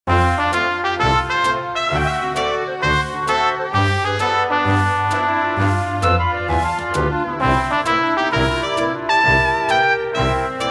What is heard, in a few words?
Slot Machine; Dragon Quest Series